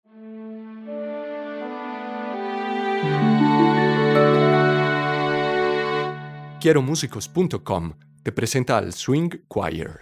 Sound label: swing